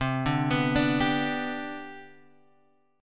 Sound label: C Major 7th Suspended 2nd C down Guitar Open G, chord